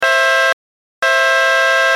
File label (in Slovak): elektropneumatická fanfára 12v ultimate blast; fiamm